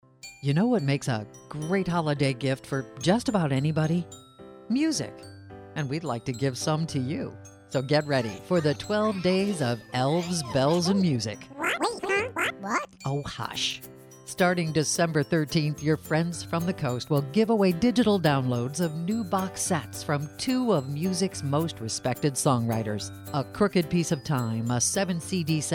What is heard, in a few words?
Elves Bells Music